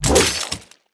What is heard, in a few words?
dash skill